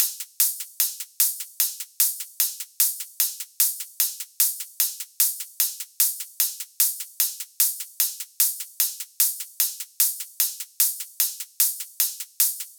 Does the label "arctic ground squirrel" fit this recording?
No